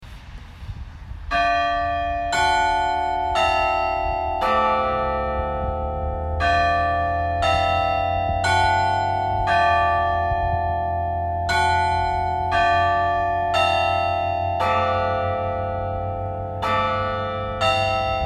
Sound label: Bells